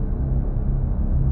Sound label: dark magic loop